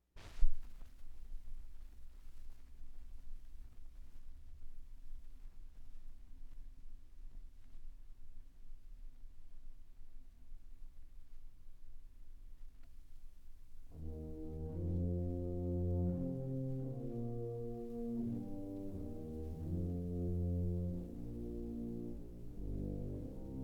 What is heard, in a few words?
Declick and Decrackle